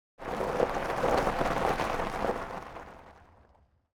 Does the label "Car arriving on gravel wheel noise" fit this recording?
Yes